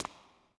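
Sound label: reverb run, footsteps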